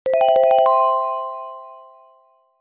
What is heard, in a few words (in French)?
Son Correct